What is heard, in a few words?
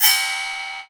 Reco Reco Long; Multisamples; Digital Sound Factory Planet Earth